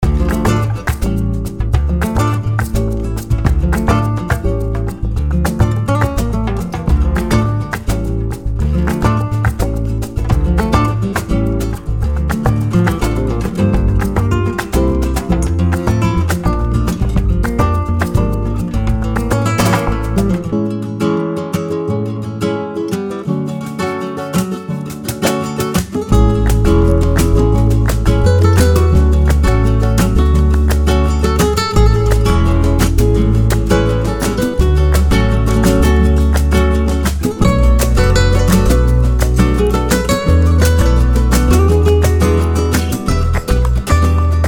Smoking Hot Latin Guitars

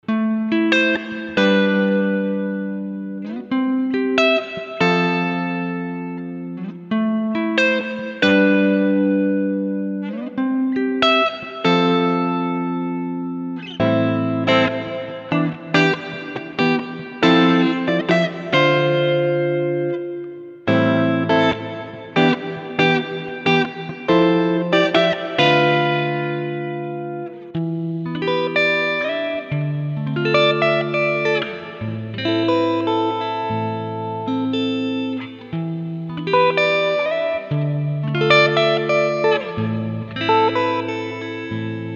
Smooth Silky Guitars

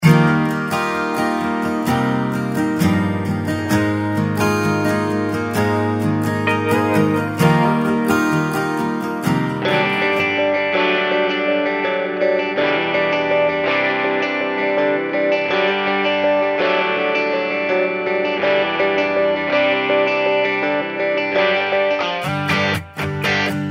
Modern Country Guitars

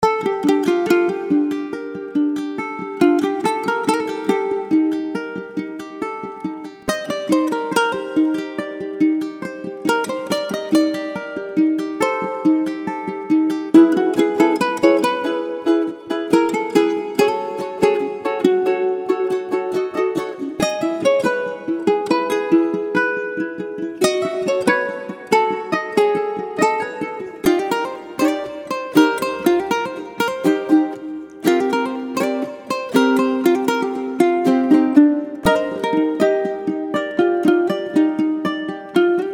Ukulele Rhythms

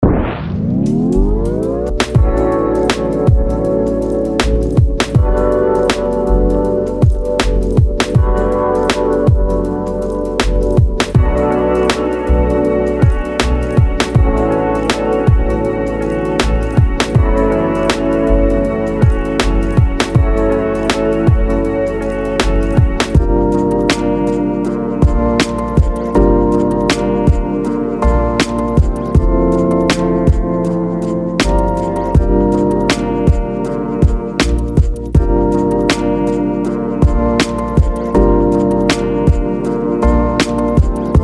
Lo Fi Lush Hop

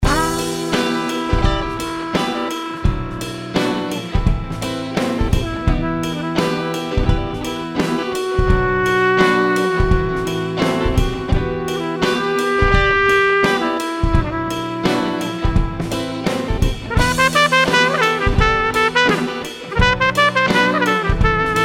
Smooth Trumpet Grooves

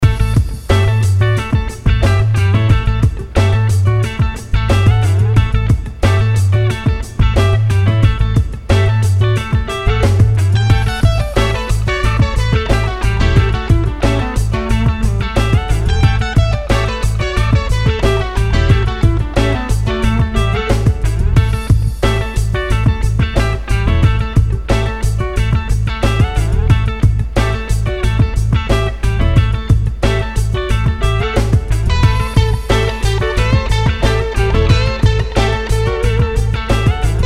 Smooth Guitars

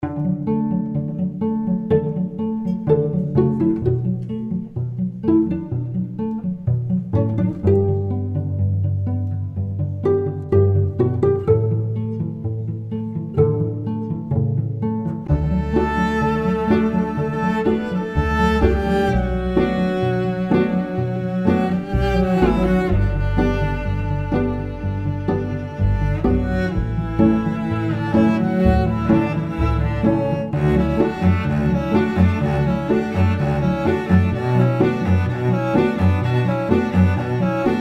Curious Cello Ensemble

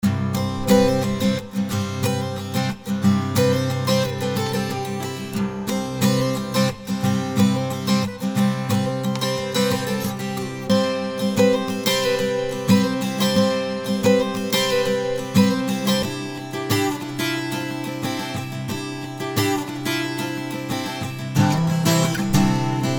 Open C Acoustic Guitar

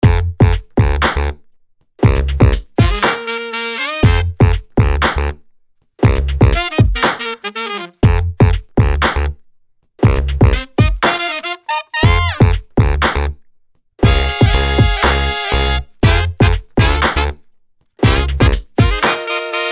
Tripple sax

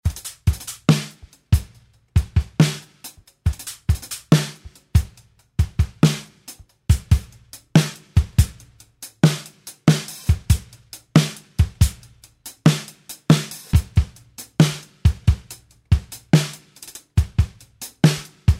Acoustic Trap Beats

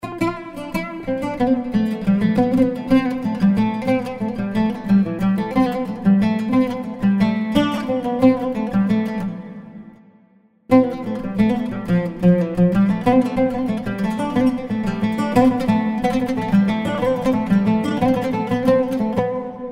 Oud Rhythms; loop pack; Oud Rhythms Vol 1; Vanilla Groove Studios

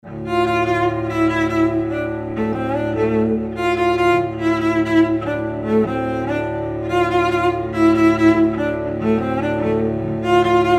Cello Moods

